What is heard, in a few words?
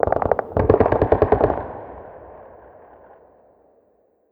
city battle; War Ambience